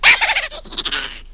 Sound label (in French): rire